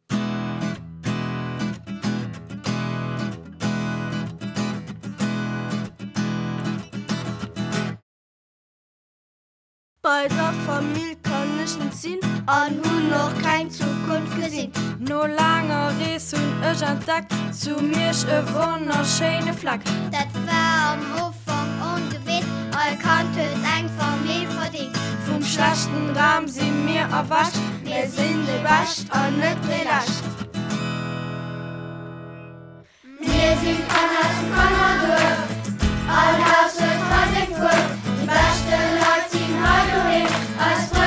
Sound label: song; kannerduerf